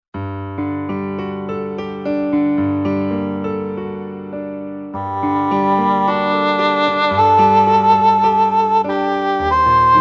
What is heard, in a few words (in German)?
Englisch Horn